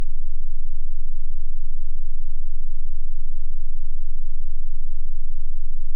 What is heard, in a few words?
phase noise test